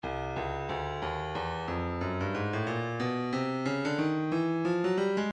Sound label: breakbeat 091 bpm; Stylus